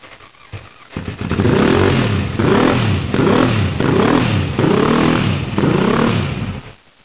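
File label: Ducati start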